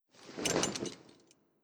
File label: Attack Mamluk Clothes